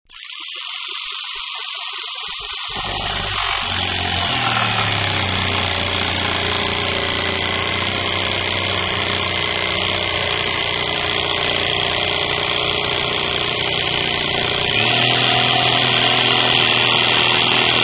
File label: Jigsaw Cutting Long; Saws; Machines; Manhattan Production Music